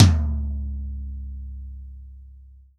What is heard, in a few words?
TOM, Real Drum Kit, POP KIT